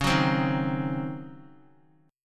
C sharp Major 7th Suspended 2nd Suspended 4th, Guitar; C#M7sus2sus4; strummed